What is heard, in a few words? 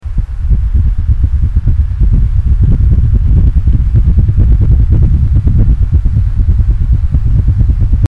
Fan